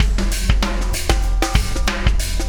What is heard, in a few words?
Wormhole Beat, Processed Drumkits